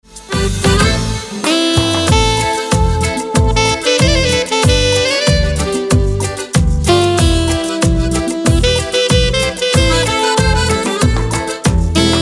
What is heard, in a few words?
KALIMBA